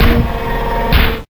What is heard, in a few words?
Chest Close; Factory Boss